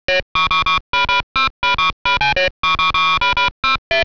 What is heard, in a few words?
door door; ドアドア; プレイ開始時; ＰＣ８８０１